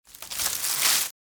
Twigs and foliage crunch; forest

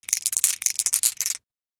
Pearl necklace with beads movement

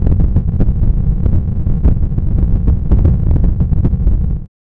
Interference noise under water

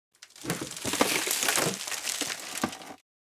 Designed cinematic wood textures crunchy pressure

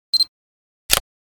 Camera shutter click autofocus with flash

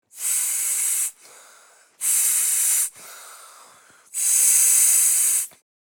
Rubber ring inflation blowing; inflatable

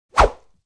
Fast swish whip bamboo stick

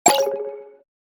Online item purchase e commerce

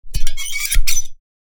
Rusty puncher plier single press

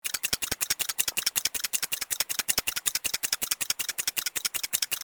Bomb analog ticking timer; Explosions

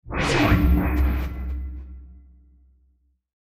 Action adventure game menu ui clear

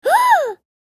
Comic surprise gasp; shorts sound effects

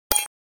Digital button

